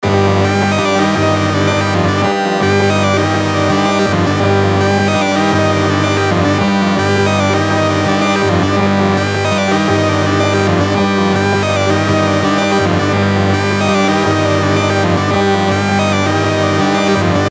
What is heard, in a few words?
loop 110